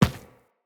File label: Infested Medium Run Default, Footsteps